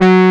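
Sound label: horn G2, synthesizer analog horn; AKAI S6000 CD-ROM - Volume 1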